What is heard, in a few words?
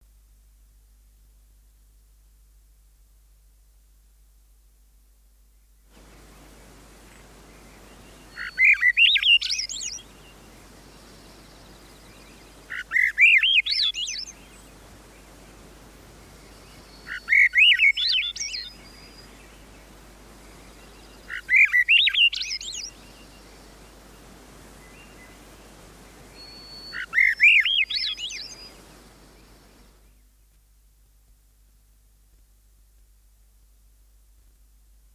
swainsons thrush, songbirds